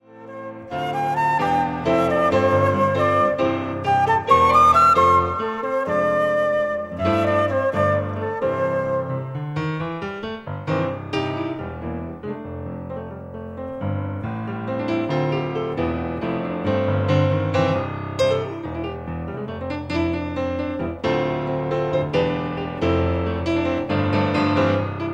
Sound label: Celebration (instrumental)